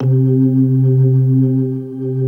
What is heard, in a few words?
HAMMOND C3; Jazzistic